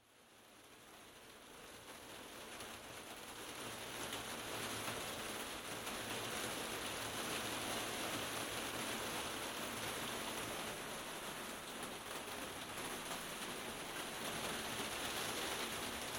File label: soundscape rain